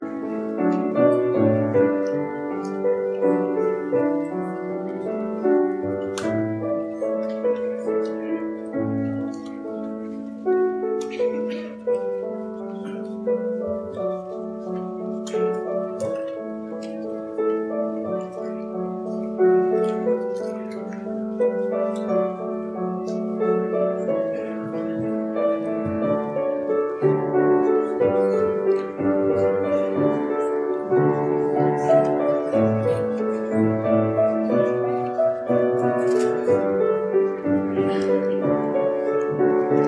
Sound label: preservice, instrumentalists